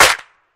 hand clap c key, hand clap sound tuned to the C note. Loudest frequency: 3360Hz; single hits one shots